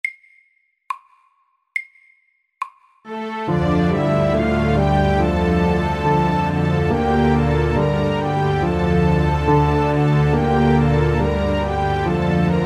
Gammel fransk melodi sakte

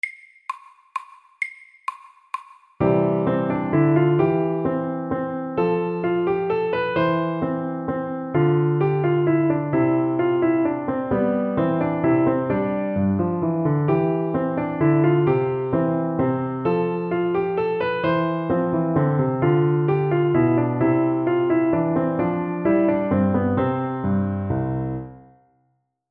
Menuett i G dur FORT

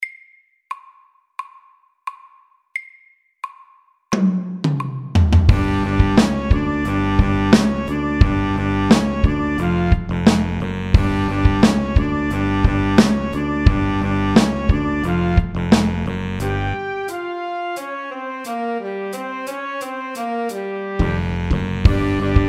funk MIDDELS

